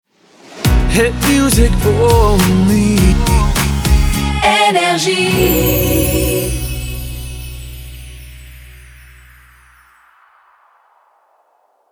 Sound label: Jingle Shotgun; NRJ